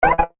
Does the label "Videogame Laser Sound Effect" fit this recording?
Yes